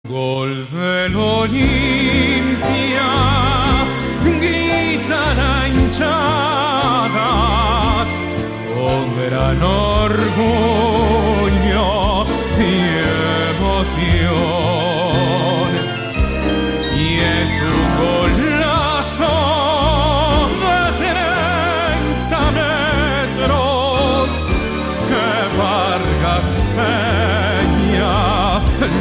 Tango

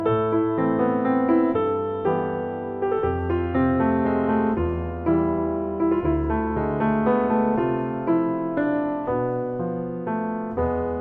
Waltz

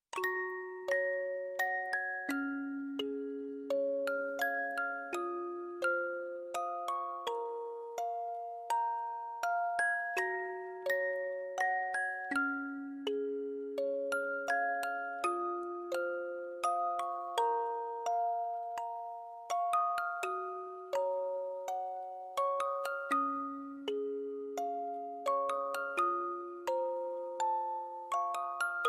MusicBox

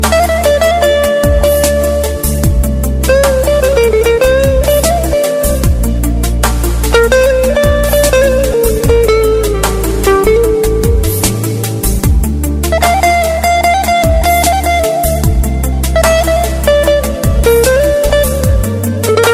Azeri Guitar

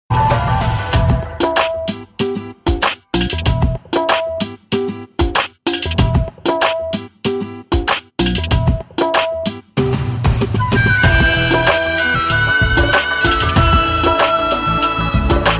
Nice Tune

